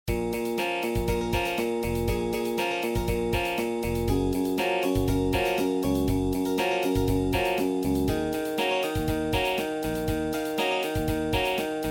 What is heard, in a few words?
instr; ai教サウンドロゴ002『おまつりとごほうび』